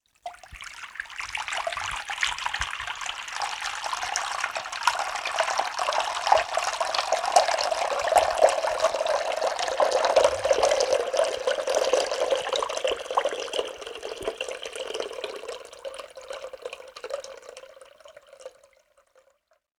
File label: Water Running In A Sink; Water Sound Effects